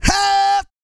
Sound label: YELL, MALE HUMAN; Spectrasonics Vocal Planet